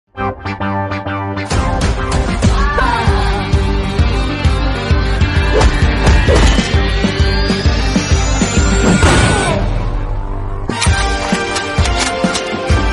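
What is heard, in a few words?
background music ringtone; raja vikramarka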